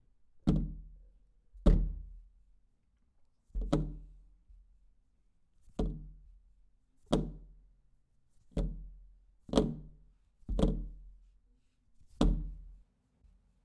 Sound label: Dead body hits wooden floor